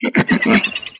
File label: run grunt, headcrab reviver